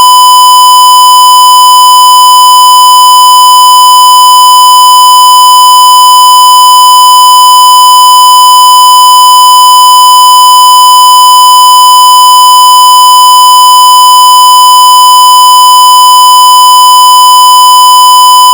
1kHz square 0dBFS

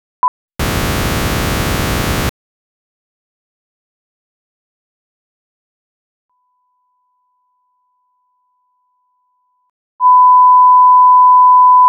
Test signal no noise dithering; 44 kHz 16-bit

